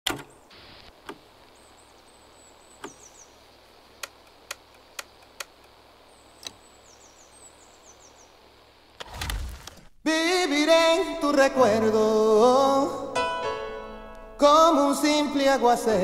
Puerto Rican, Colombian and Latin American Folkloric Sounds